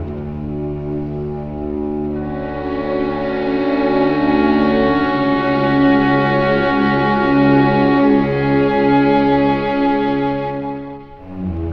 strings in mourning, Sad; Loops

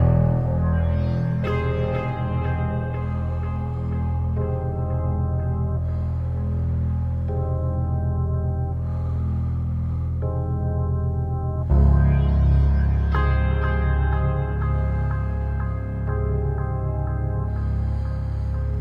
piano, Loops Sad

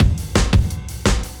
Drum loops, Funky

